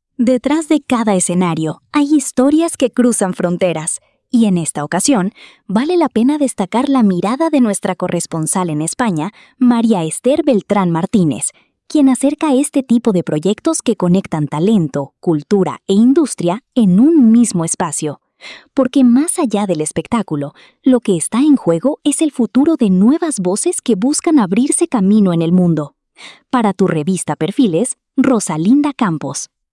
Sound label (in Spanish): voces; expo compositores 2026 gran final nueva voz